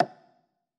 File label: click